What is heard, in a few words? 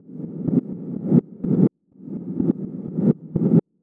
125 Atmosphere, Percussion (Mono); Touch Loops; ElementsOne; AbstractRhythms